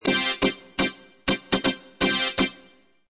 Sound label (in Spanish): melodia whatsapp tono de aviso de mensaje; SONIDOS WHATSAPP